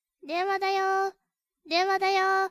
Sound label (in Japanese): denwa